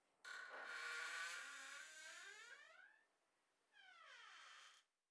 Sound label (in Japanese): 古いドアが開く音